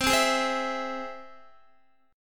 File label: C Augmented, Guitar, chord; strummed; x,15,12,13,12,12